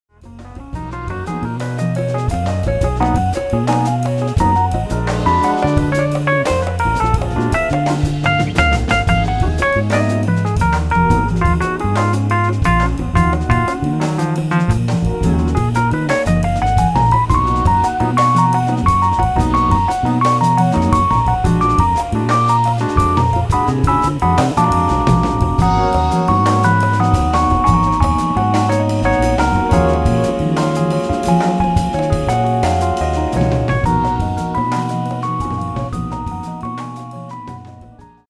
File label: Rhodes Solo